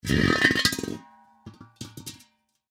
Propane Tank Up, Propane Tank Pick Up Roll On Cement; Machinery Sound Effects; Foley; Blastwave